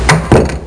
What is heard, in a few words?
Door Close